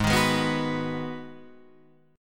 Ab Minor 7th down Guitar Half Step Down

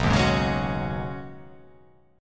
B 6th Add 9th B down, chord

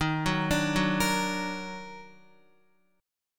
Eb Minor Major 7th Eb down Guitar, chord; EbmM7; arpeggiated; JGuitar

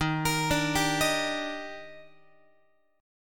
D sharp Major 7th, Guitar; arpeggiated; JGuitar

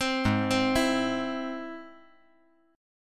Ab Augmented Ab

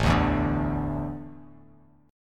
A Suspended 4th, Bass Standard

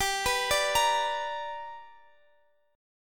B Minor Major 7th Sharp 5th

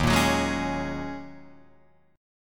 Eb Minor 11th Eb down Guitar Drop D; Ebm11 strummed

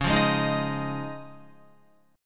Db Major Add 9th, Guitar, chord; strummed; JGuitar

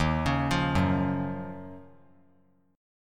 D sharp Minor 9th D sharp, down; D#m9 arpeggiated; 3C,3F,3A,3D

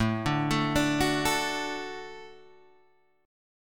A 6th Suspended 4th, guitar; A6sus; arpeggiated; JGuitar

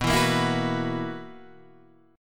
B Major 7th Suspended 4th, guitar chord; BM7sus4; strummed

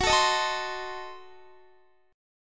F sharp Diminished 7th F sharp Guitar; F#dim7 strummed; x,21,19,20,21,19; 4E,4A,5D,5G,5C,6F; chord shape

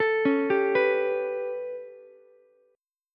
B 7th Suspended 2nd Sharp 5th down Ukulele, chord; B7sus2#5; arpeggiated; JGuitar

